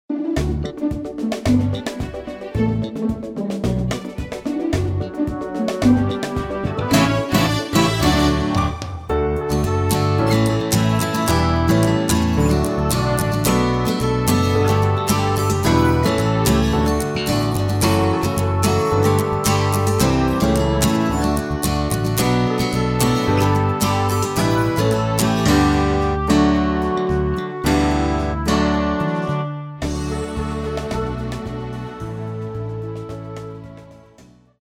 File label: Traditional Track